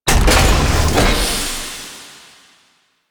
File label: Apartment Door Open; Ambience; Warframe; The New War; Quests; Zariman